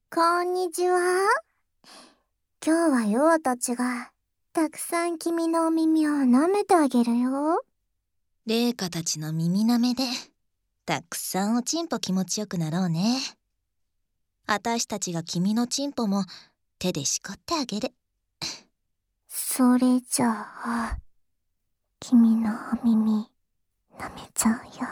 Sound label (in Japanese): 耳舐め, ASMR